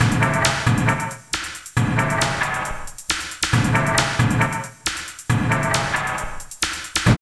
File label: LOOP, Tekno Industrial; East West